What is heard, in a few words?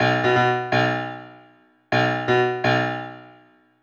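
disco fever lick piano; Best Service ProSamples vol.48 - Disco Fever; AKAI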